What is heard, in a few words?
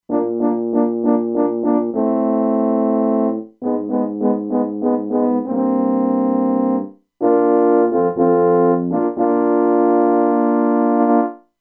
French Horn-F; Factory Presets; E-mu Proteus-2